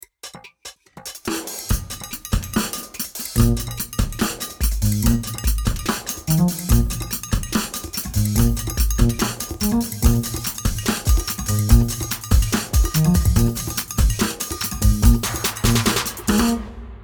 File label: Groove Funk